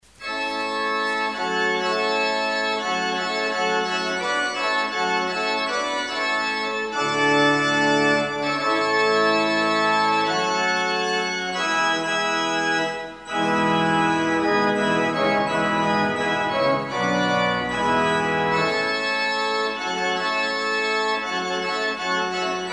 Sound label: Fanfare